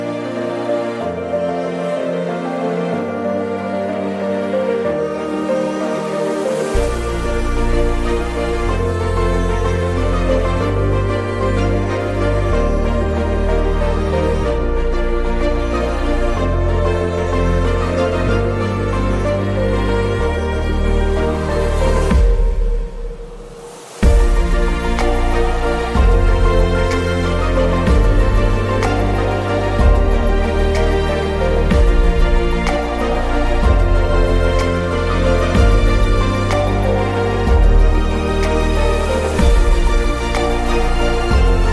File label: music